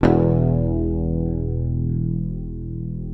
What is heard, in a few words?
PICKED, Bass, E1; Sampleheads; Will Lee Bass Library